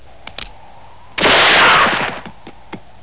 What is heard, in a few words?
open program